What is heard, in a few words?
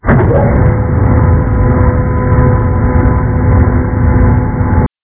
crusher